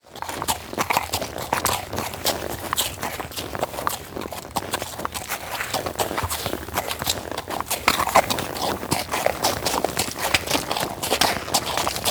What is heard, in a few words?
Animal Horse Walk In Mud; animals; AdobeCC